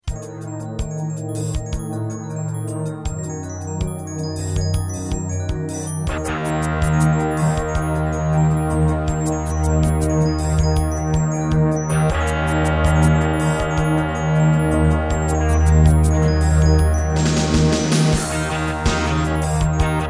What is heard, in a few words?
Terratec MiniWaveSystem TTWT; Battle Isle II